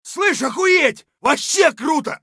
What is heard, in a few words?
scav cheer; eft